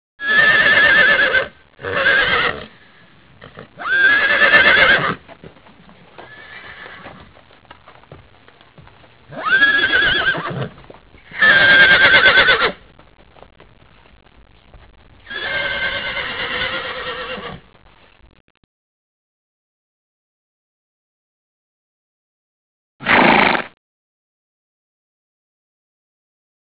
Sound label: horses